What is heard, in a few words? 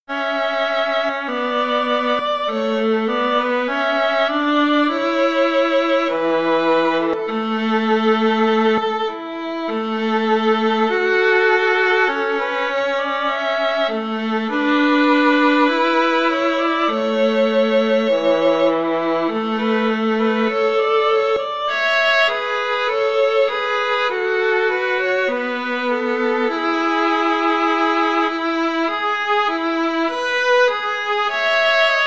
rule britannia, violin viola duet